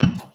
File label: One Shot, Hits